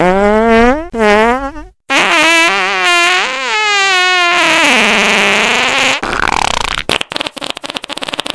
xms fart; svencoop; merryxmas

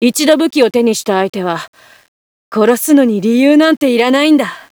death scream; svencoop; player sounds; lappland

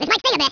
sting; sc toysoldiers; fgrunt

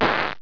zap, debris; svencoop; cracklife

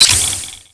tib pickup; svencoop; commandandconquer

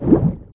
swim; zelda; link

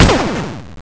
shot; svencontra2

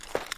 step; svencoop; legends

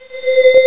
elev bell, plats; svencoop; cracklife

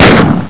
pl gun, weapons; svencoop

